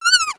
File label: scared whimper; creatures; headcrab classic; hideout